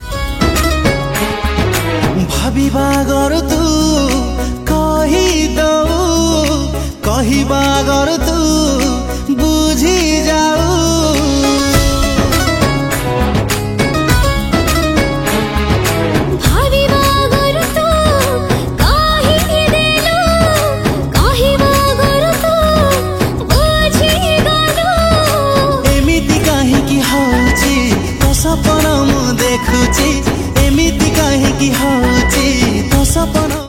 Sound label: ODIA SONG